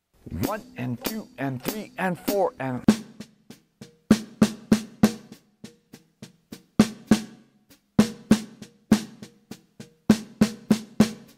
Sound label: Melody Straight 8ths